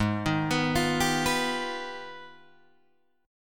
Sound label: Ab Minor Major 13th, down, Guitar, DADGAD